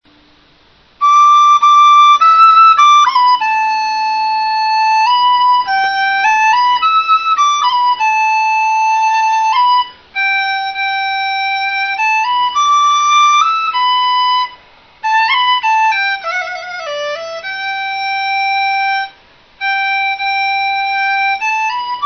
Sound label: dizi